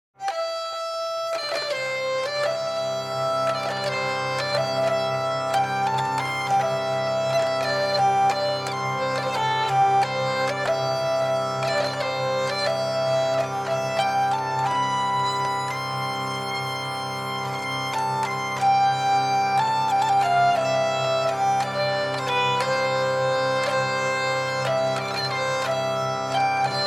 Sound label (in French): Valse lente